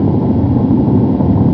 fly crate

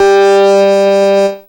clean, synths dancerap; The Sample Bank

